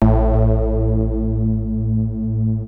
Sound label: dance, bass; Techno Trance Essentials